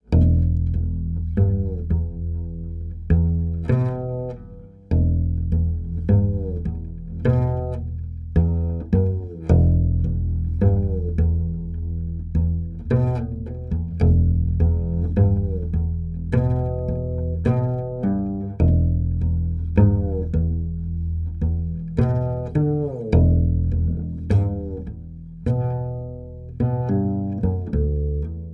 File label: bass play music; gamerip; The Sims 2